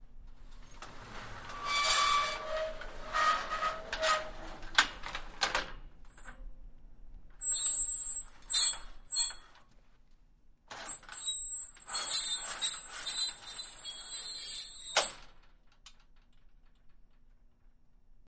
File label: Door Accordion Sliding Metal; Single; Open And Close 3x, Very Squeaky, Slow, Medium And Fast; Metal Gate